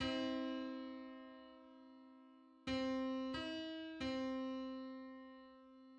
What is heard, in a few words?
Six-hundred-twenty-seventh harmonic on C